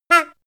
horn short

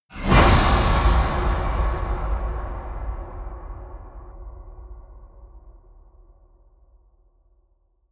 eerie slasher

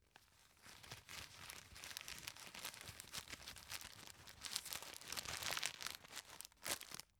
tissue stuff in package

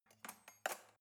cutlery knife drawer handle soft

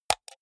computer mouse right click hard

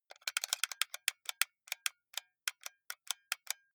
console controller xbox play sequence lively

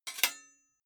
sword sabre metal block

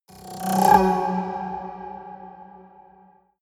haunted strings roll high

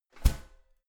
garbage can close lid damped

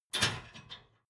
dishwasher frame fold up soft

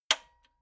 metal button radio spring high

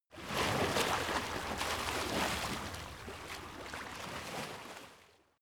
lake oneshot surf

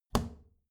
plastic trash can close regular muted

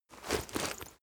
leather metal walk slow single

